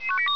COMPUTE, Gadgets; XCOM

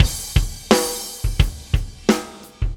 Straight Rock 86bpm, Drums; 1m

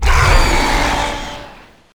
die

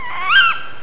owl

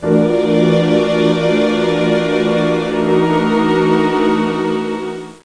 spooky; Into That Dark Night; YadVashem; music

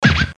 scratch; Sound Bar; Midisoft

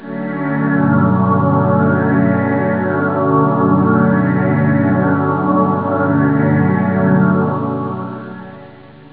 smooth, synth

